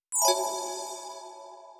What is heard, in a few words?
fill bell; lead arp chord melody